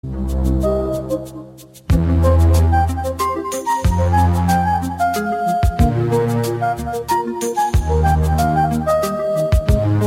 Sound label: bossa baroque